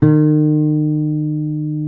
E 3 MARTIN 6, Guitars; Spectrasonics; Hans Zimmer Guitars VOL-1

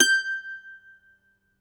MANDOLIN, Plucked Strings

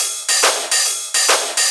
Shuffled Loops, 140 BPM

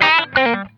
Funk Guitar; Zero G - Funk Guitar

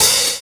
Hi Hat, drums; Sonic Foundry (Sony Creative Software); Drums & Drones; Construction Kits; Stimula Kit

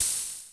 noise fx; Masterbits; Switch FX

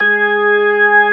B3 G#3, organ; AKAI S-Series CD-ROM Sound Library VOL-1

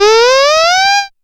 Dance Synths, Acid Bubble, g

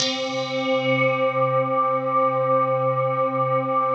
PAD, DIGI-PADS

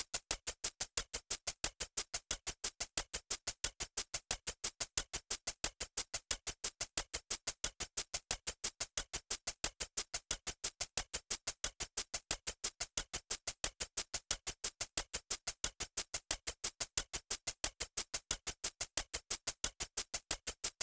PERC LOOP 90 BPM; percussion and foley; MURMUR

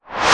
CLUB, REVERSED; Wizoo; Platinum 24 Electronic

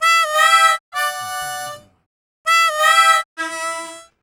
Harm 098bpm A, Harmonica

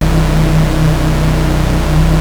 Prophet 5, B1; Hollywood Edge - Giorgio Moroder Rare Synthesizer Collection

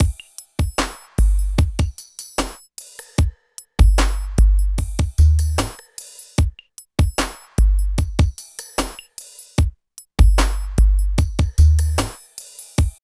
LOOP R; East West - Phat & Phunky

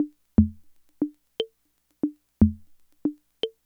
Loop, classic machines, rhythm77

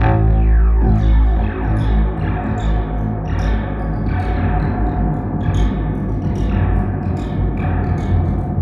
Quantum, Processed Percussion

